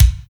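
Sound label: edgey room kick; NATUR. K1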